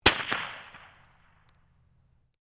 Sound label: RIFLE CZ 452 VARMINT 22 CALIBRE FIRING; A selection of domesttic firearms; Rifles; Guns